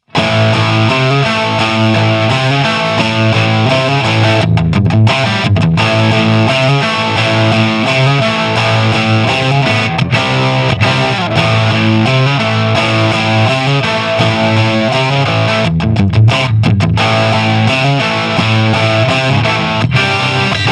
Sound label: Channel 2 Full Gain